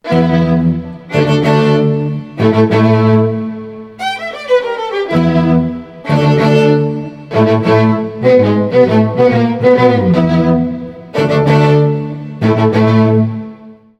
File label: Quartet